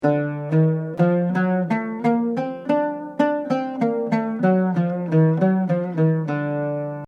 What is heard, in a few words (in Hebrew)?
צבא, מאקם ומוזיקה ערבית